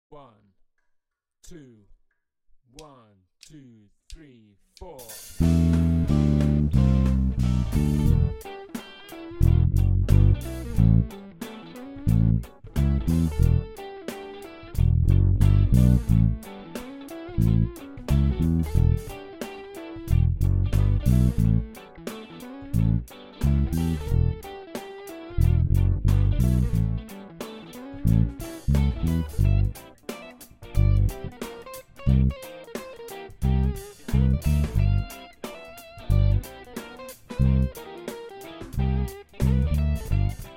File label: Shuffle and Funk, Bajo; Players Path Level 2